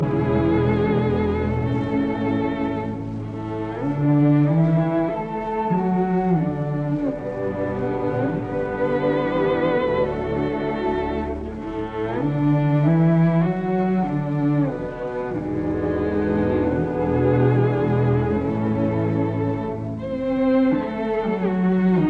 Double Concerto for Violin and Cello in A minor Andante; Brahms; Op 102; Adolf Busch